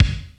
DDR30 BD; Crypton - DrumBase5000; RZ1

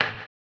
HAMBONE, Vocal Blues Soul; Spectrasonics; Planet

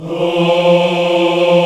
VOX, African Aahs; Roland LCDP11 Africa VOL-1

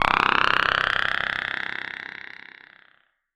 FX, 5U Modular; Polyend; Simulacrum Sounds; 1 Shots; Synths

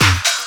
COMBI, Houseworx; Ueberschall; Workz-9

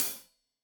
Hat Loose, Hi-Hat, Cymbals Stereo

